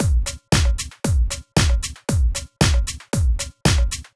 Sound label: Drumloop 115bpm, Nu Disco; Zero-G